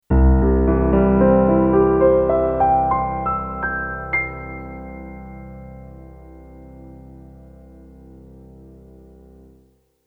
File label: HALL FX Send Tone Decay; Zoom L12; HALL1